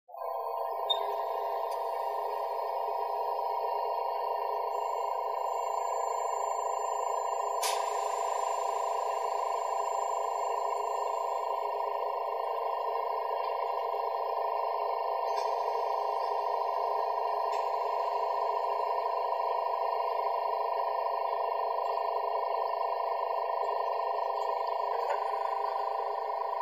Spaceship Interior; Ambience With Steam Machine Blasts And Beeps